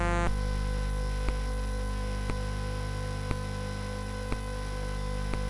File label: Microtuned; sid; CSG8580R5; hvsc